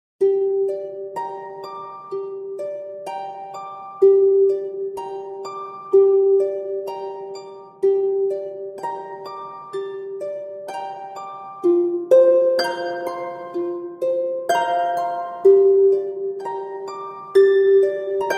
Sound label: Ringtone